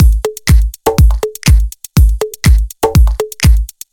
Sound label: drum loop